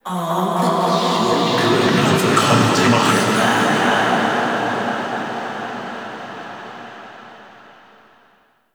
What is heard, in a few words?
Cave Echoes; The War Within; Golden Maw; Queen